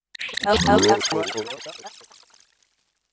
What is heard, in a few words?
Reverse; Sonic Foundry (Sony Creative Software); Pocket Diva World Class Vocal Samples